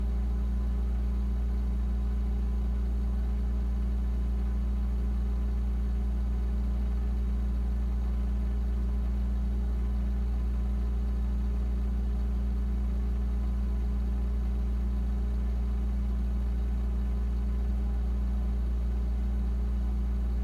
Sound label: light machine; teardown; ps5 windows xbox series xs